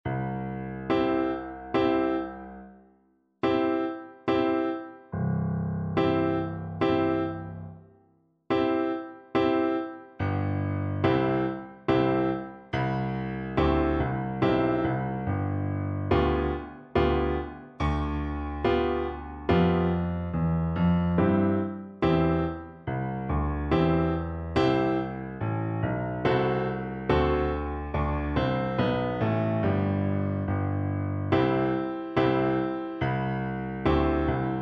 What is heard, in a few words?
elephant BN, Bassoon